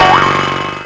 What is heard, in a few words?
REPULSE